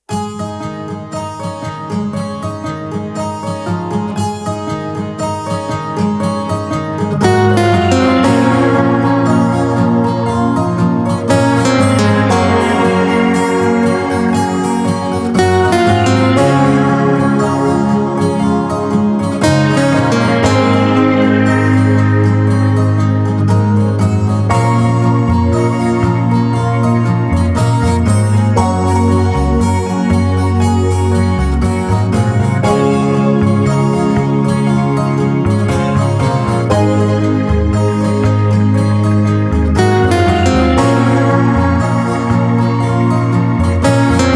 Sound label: Guitars; Royal Guitars